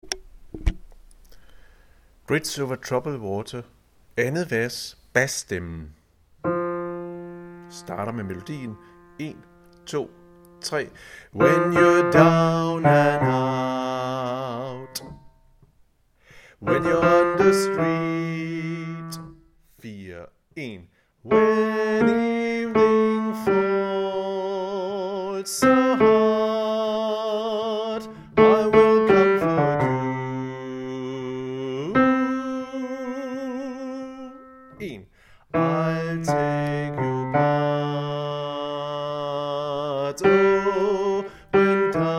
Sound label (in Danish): bas